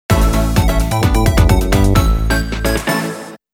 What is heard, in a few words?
Bonus Game Jingle; Clock Work Aquario